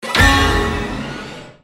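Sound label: Music Failure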